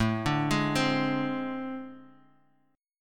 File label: A Minor Major 7th Double Flat 5th Guitar Standard; arpeggiated; JGuitar